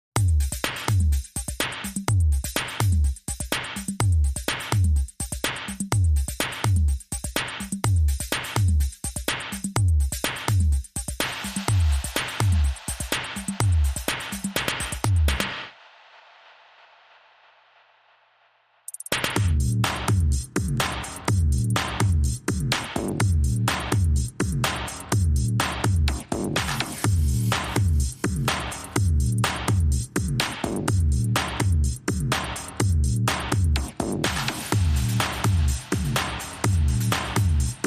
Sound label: Bonus Beats